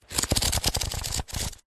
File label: household book old thick thin pages well read pages flick through; zapsplat